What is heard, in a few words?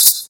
sharp shaker one shot e key; percussion